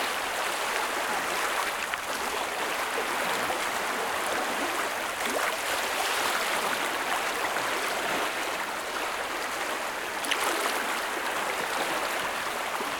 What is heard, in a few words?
Waves On River; Stefano Cremona; Pure Nature Ambiences